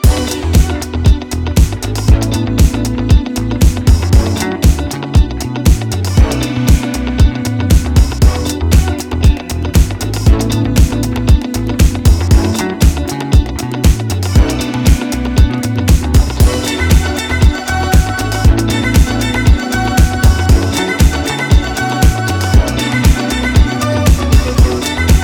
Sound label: music